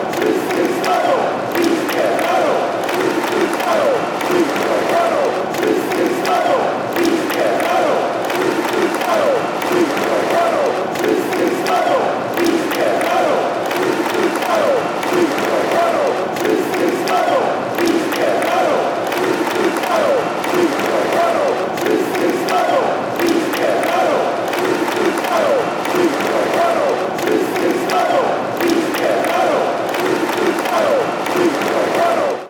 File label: everybody standing and singing, fanchants